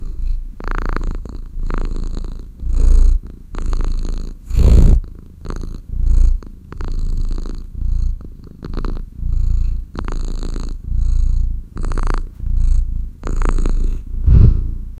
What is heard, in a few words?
little kitten purrs asmr